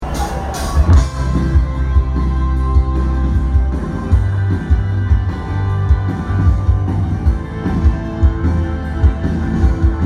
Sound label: Ring The Bells